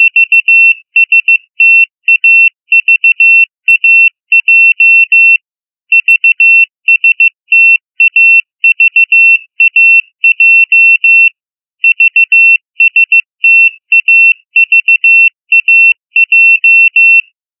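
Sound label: 2700 budilnik, alarm clock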